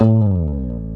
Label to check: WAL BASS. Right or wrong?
Right